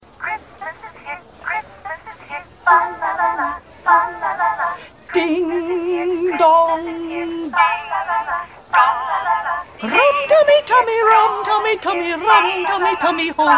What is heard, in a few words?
chorus ringtone